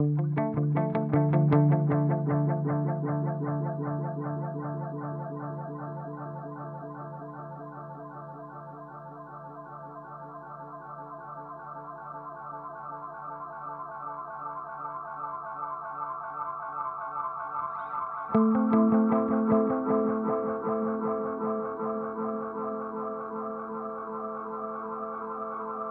Timeline Digital; Strymon

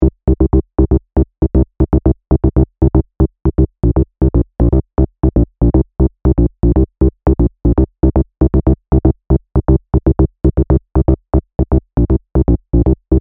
Rave Cave Bass C

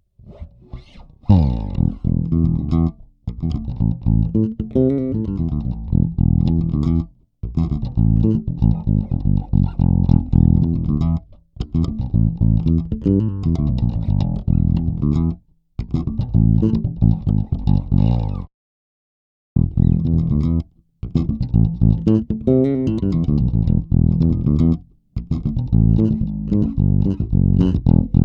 Glowplug Active Bass Bypass Soft sat

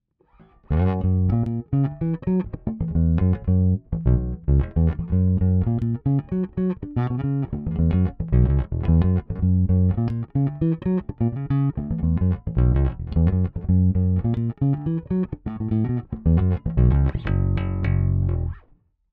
Funk

